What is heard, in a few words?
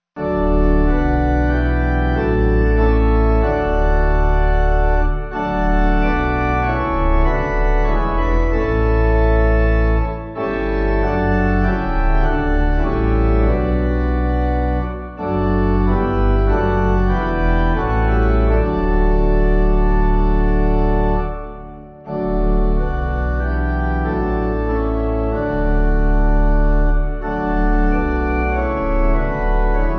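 Pipe, hymn backing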